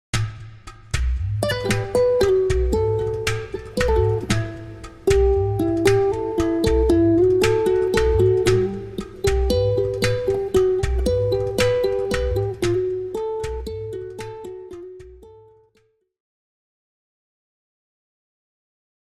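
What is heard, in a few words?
happy guitar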